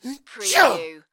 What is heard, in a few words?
Sneezing, Male Sneeze